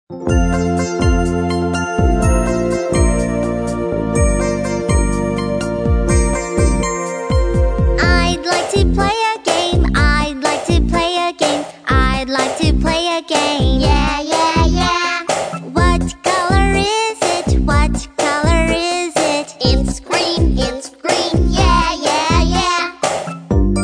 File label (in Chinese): 儿童歌曲; 儿英